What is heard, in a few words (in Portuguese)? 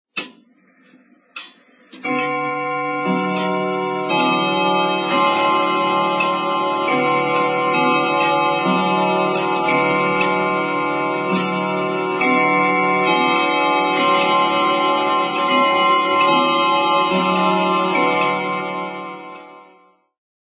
Vinhetas Badaladas de Relogio de Parede